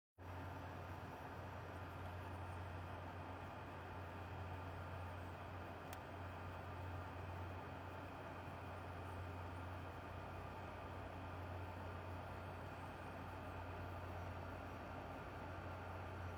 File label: server noise